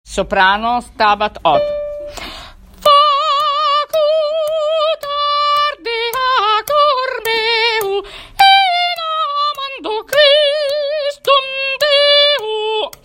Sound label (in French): soprano rapide; Mesures 1 à 19; Stabat Mater 8 Rapide